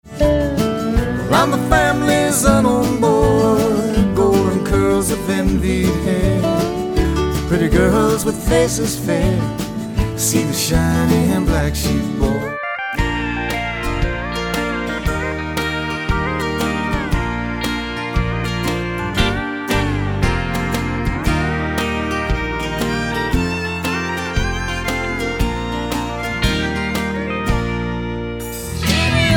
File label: Country Medley